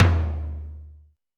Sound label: Tom Amb Lo Clear, Alesis D4 drum machine